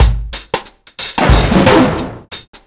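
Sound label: reggae drum FX; Best Service Advanced Media Trax Modern Composer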